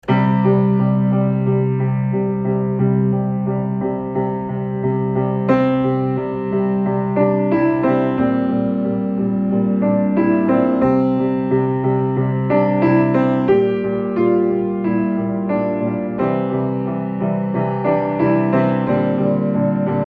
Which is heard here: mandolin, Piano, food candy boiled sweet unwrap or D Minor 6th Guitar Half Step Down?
Piano